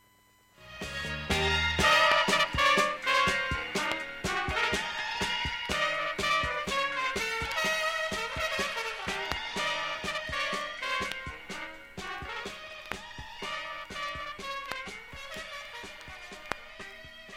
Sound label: Jazz Rock